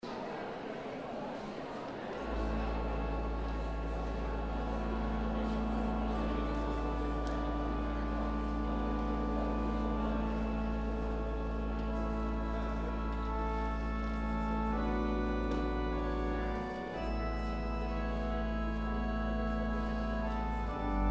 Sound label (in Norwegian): Det er Jul orgel